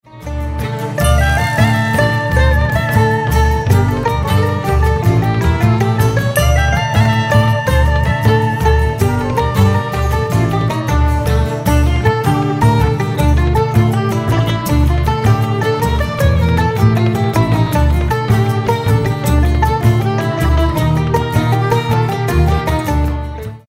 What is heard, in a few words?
okarina tattoo